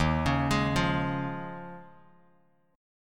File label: Eb 9th Suspended 4th, Bass Standard; Eb9sus4; arpeggiated; 11,16,18,10; JGuitar